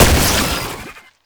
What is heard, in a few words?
Infested Spore Pistol Shot; Weapons